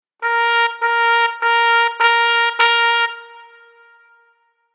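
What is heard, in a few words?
Horn Velocity Crescendo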